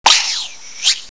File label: poof